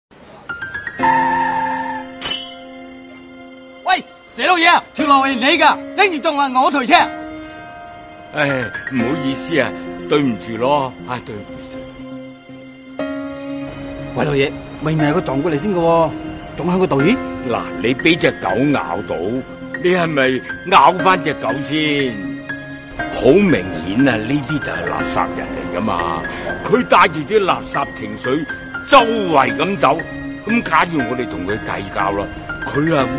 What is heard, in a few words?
laugh; breadradio